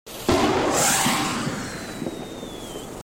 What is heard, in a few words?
Flare; Arc Raiders